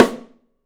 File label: Drums, MARCHING; 3D Drums; OP MARCHING